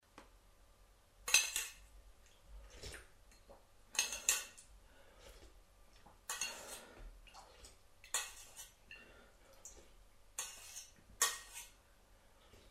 comendo con metal

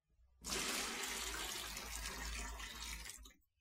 son agua emborcando

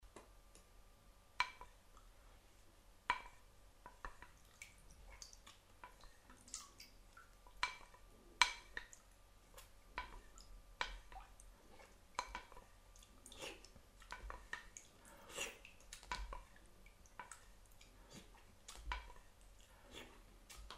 comendo con madeira

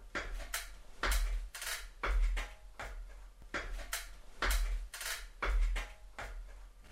baixada escaleira

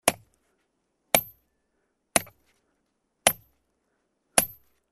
picar pedra

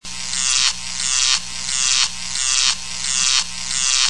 lampara electrica faro

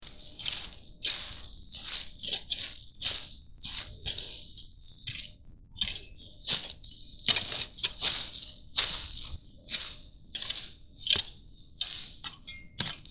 rastrillar area e pedra

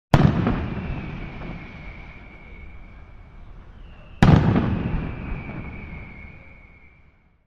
bombas festa

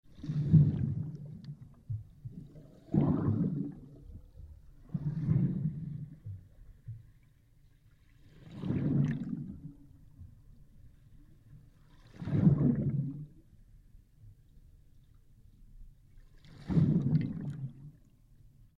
submarino sumerxendose

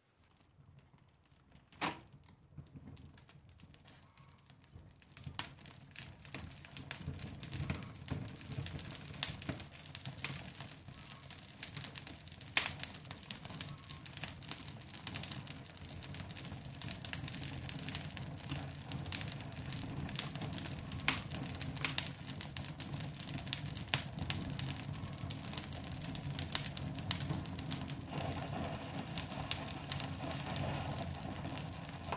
fogo con ramas

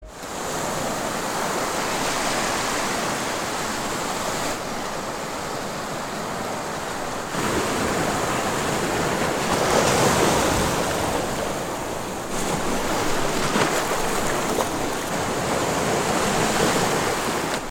mar de percebeiros

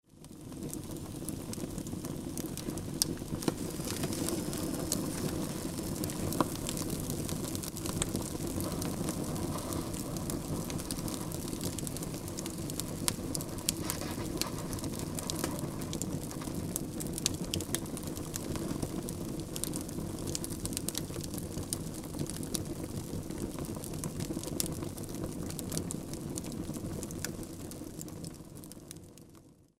lume

